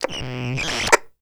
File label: Walkie Talkie Interference; Communications